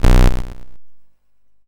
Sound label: YM Bass B, chip; ChipShop Deluxe; ChipShop Hits